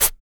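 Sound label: PERC SHAKER, MALE PERC; Spectrasonics; Vocal Planet; Jazz FX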